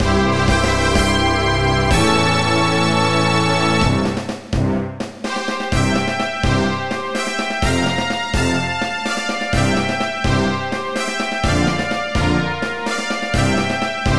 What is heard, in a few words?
Victory Fanfare; Dragon Ball Z: Attack of the Saiyans; Dragon Ball Kai Saiyajin Raishuu; NDS